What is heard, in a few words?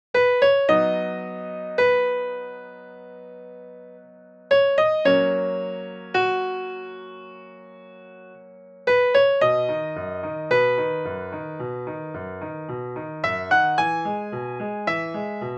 Birthday music